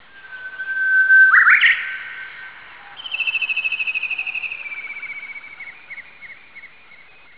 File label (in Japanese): uguisu